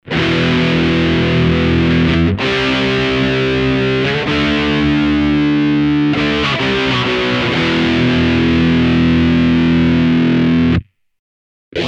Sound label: Ross Phaser Phaser after distortion